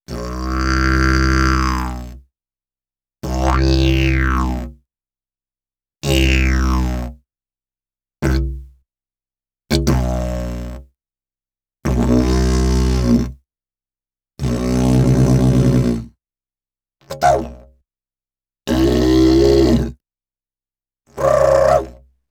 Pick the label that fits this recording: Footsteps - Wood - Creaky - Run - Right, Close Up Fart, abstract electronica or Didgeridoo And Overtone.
Didgeridoo And Overtone